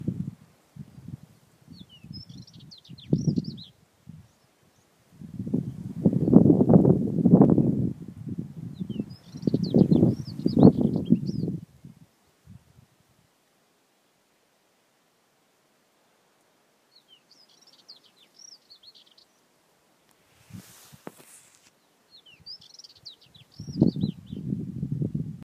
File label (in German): brillengrasmuecke